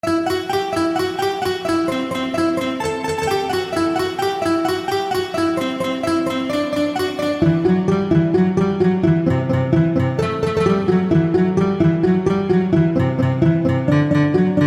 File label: Acustic guitar